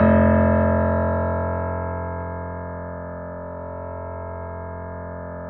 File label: F 0, Keyboards Acoustic, GRANDP ST; USB Soundscan vol.17